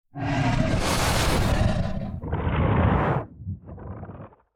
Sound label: Dragon sniff breathy inhaling and exhaling